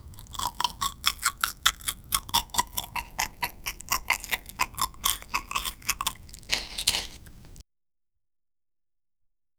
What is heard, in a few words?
a big single bite on, crunch